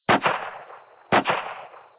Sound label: idle human; cstrike